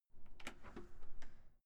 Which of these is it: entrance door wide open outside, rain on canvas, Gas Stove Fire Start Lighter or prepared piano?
entrance door wide open outside